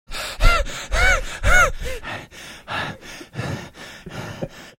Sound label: mimicking running out of breath